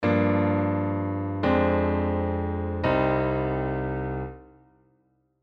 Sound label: Negative Harmony Plagal